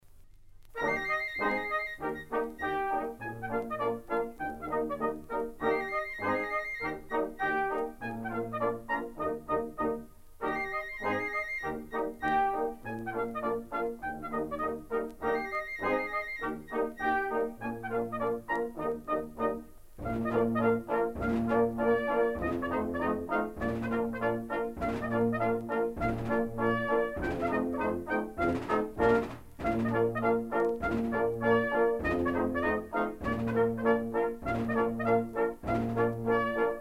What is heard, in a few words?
polka